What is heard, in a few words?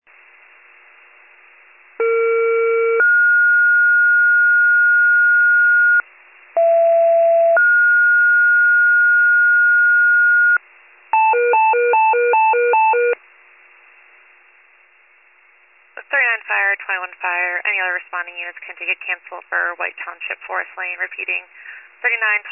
fire, pager alert